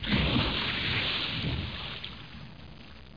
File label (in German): platsch